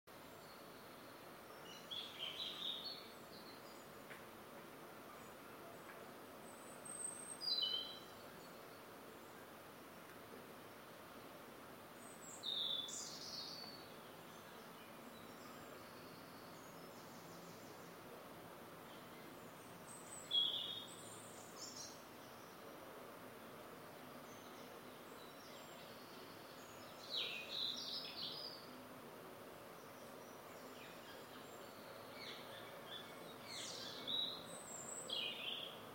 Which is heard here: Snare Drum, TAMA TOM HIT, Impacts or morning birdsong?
morning birdsong